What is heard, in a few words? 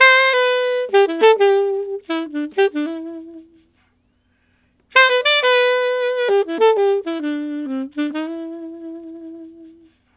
Alto Jazz Waltz in C, Sax; Sonic Foundry (Sony Creative Software)